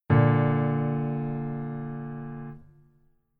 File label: Keyboard Voicing Basic